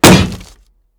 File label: shield light block big